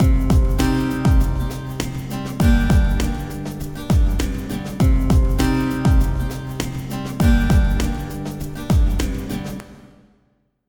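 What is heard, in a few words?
Drums Acoustic Guitar Dancehall; PerfectSpace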